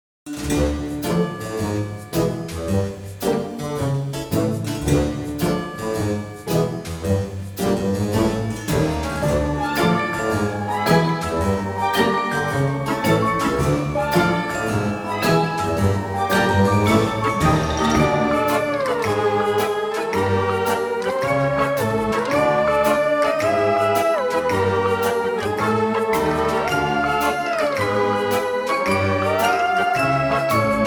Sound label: Halloween Background Music Instrumental Music